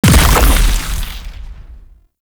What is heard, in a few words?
Octopede Oblivion Proj By Explosion; Warframe; ManInTheWall